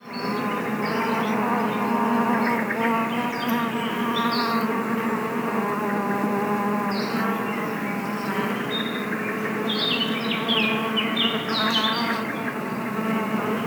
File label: bees woods; animals; best service Blue Box